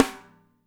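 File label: SN, drums; DRY KIT; Spectrasonics; Burning Grooves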